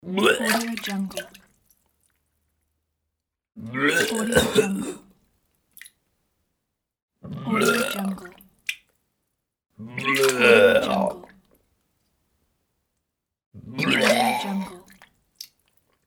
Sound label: human vomit pack